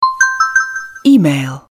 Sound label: EMAIL NOTIFYING ALARM